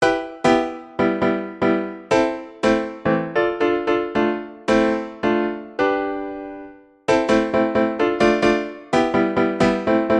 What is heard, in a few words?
jingle